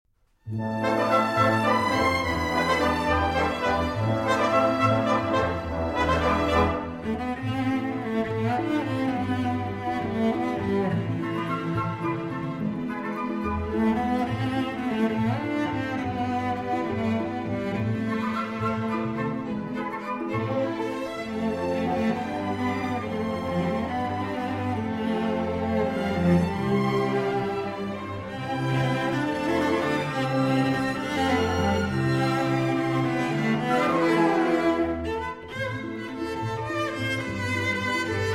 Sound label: Cello and Orchestra